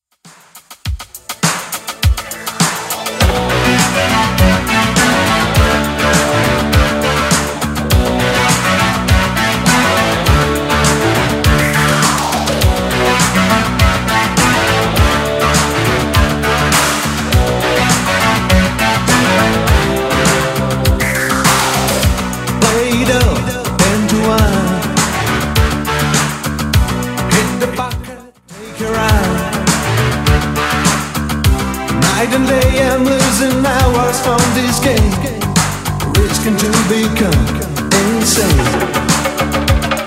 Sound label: 80s Redrum 102bpm